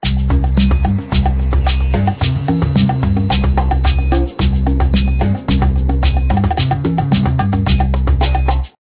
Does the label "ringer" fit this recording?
Yes